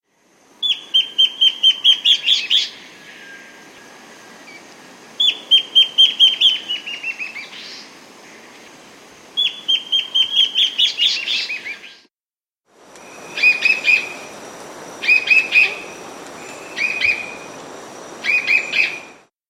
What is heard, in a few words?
Warbling Antbird; S. America